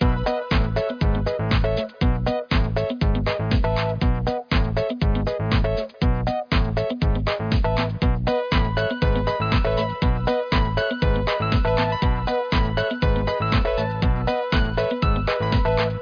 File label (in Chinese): 背包 旅行界面音乐